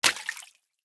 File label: bolt water, material; STALKER; IWP